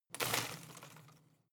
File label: home plant leaves rustle regular short